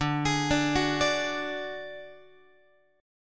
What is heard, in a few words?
D Major Flat 5th D Guitar, chord